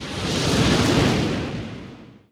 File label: wisp spawn; weapons; dota; keeper